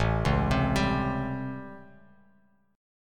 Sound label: A 7th Sharp 9th, Bass Standard; A7#9; arpeggiated; JGuitar